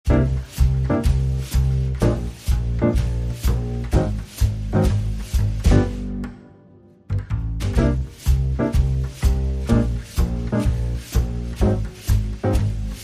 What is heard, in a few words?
Jazzy Jams, with Brushes